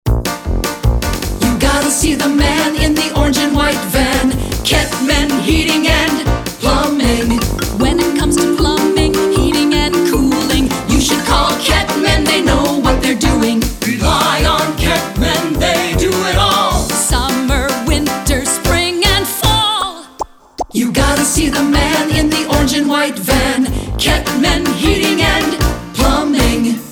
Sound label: Jingle